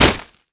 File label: knife hit wall; arccw go